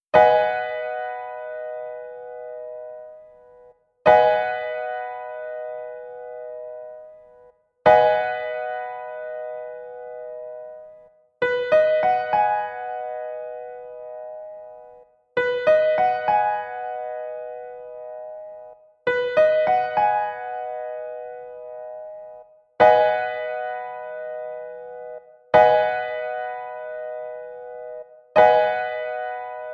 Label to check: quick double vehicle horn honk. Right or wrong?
Wrong